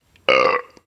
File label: burp